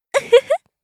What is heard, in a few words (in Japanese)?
uhuhu, 声っぽい音